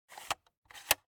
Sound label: Viewmaster, plastic, lever, load release; Eiravaein Works; Vaeyan IV